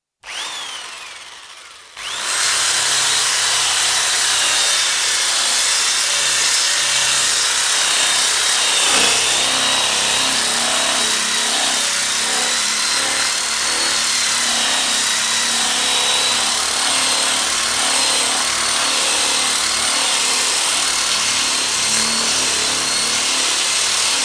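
Electric drill, rotary sanding attachment used on plywood sheet; BBC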